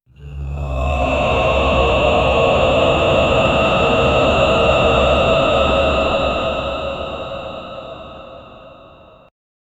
ultra realistic shamanic, occult

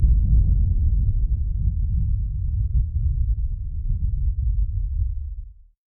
whale bass exhale, animal

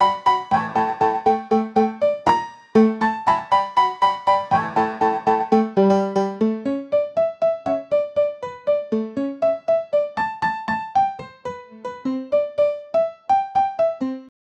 latina dance piano songs

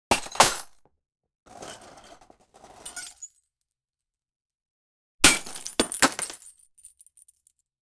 treasure box broke and go

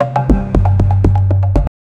critical success inspire, tribal

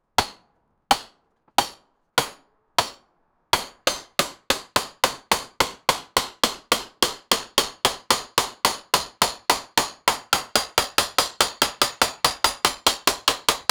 a flaming warhammer smash

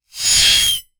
high pitched goblin scree

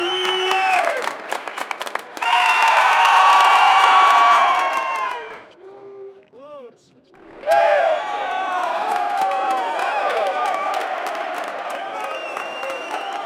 stadium ambient hooligans; event

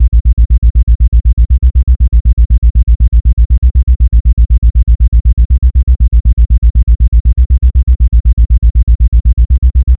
fast cut bass rhythm

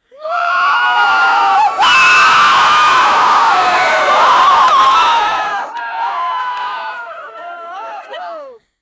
studio audience erupts, shock

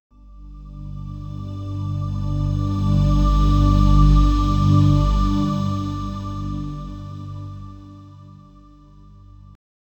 low sustained syn, pad

